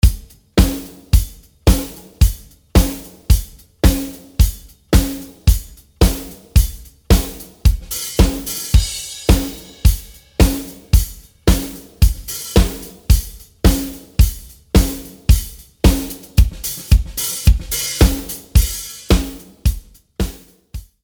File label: Drums mixdown